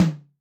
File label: Dry2 Mid, Tom, Yamaha DTXpress-II